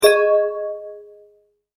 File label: multimedia tone exclamation; McKinney Sound